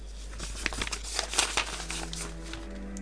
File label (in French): feuille, IMPACTS